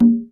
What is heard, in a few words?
CONGA; Best Service; Dance Mega Drums CD2; CONGAS